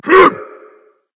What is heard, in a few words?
grunt jump berserk; cuchulainn